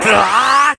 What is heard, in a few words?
ki hooa